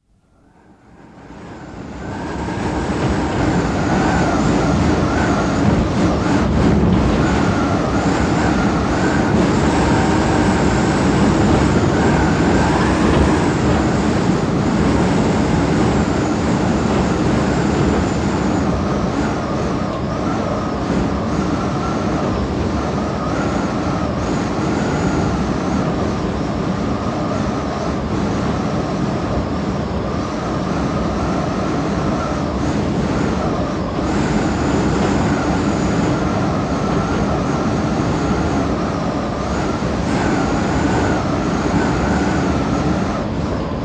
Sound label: Strong, gusty wind; Wind (Sound Effects:Weather); BBC